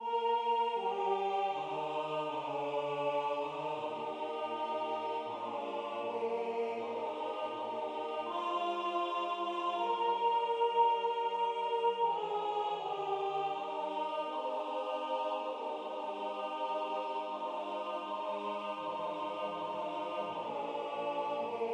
Abschied vom Walde alt

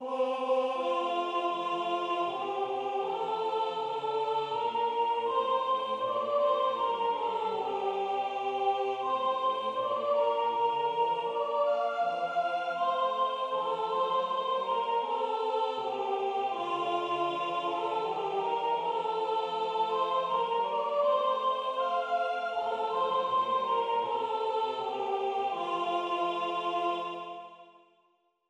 Kein Feuer, keine Kohle Sopran

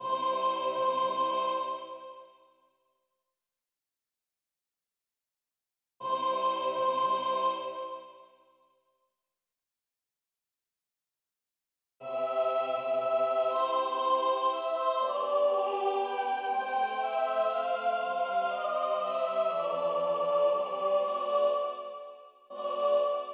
Gloria mit Soli Sopran

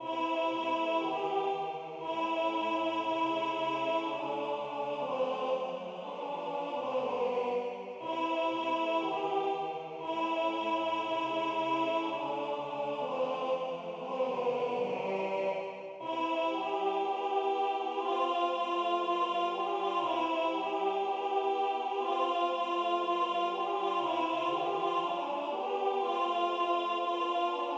Samba bernoise Alt

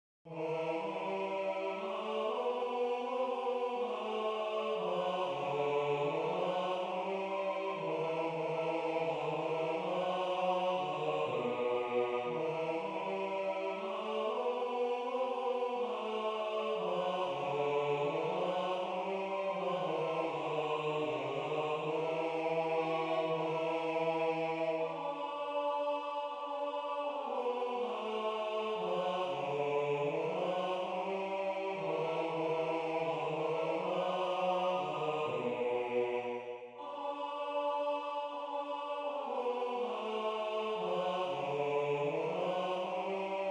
Greensleves Teil 2 Bass; Greensleeves Teil 2 (Takt 42 bis Takt 74)

